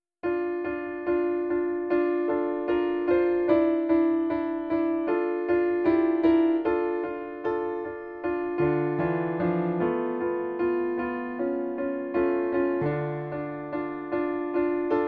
yamaha cvp 405; Miniature Op 8 n 2 Goedicke

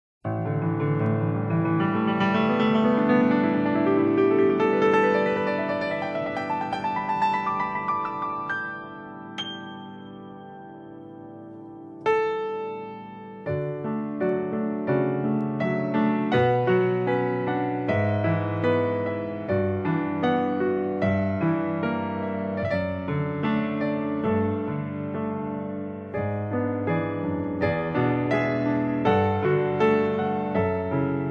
instrument

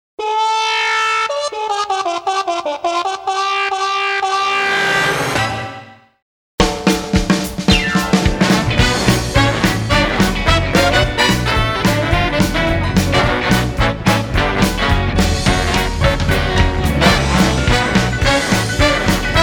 jazz; carly patterson 2004

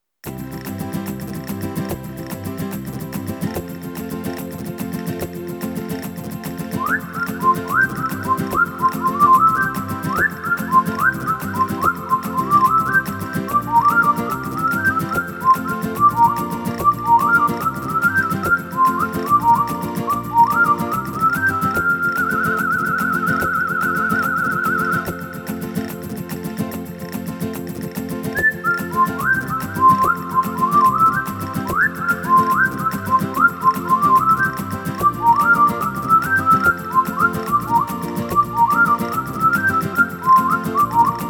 WHISTLER; MEXICAN

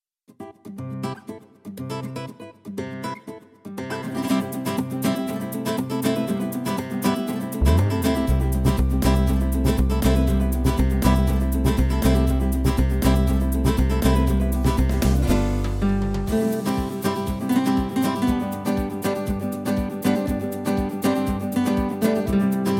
flamenco

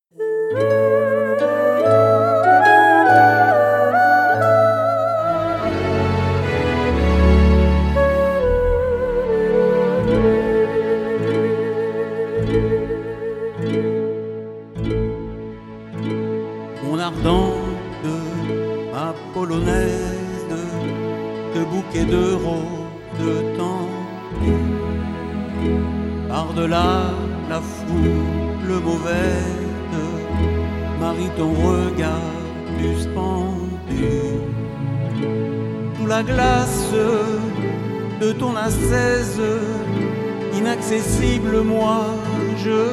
Polonaise; Polonaise 5 S263

